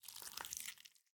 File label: kraken slither; smite; poseidon